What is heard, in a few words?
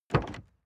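sports car exterior door open soft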